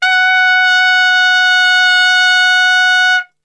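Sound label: F#3 piccolo trumpet, Brass; Best Service; Brass Super Section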